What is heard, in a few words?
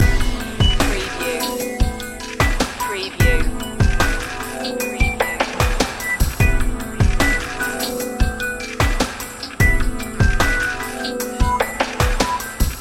ambient skies loop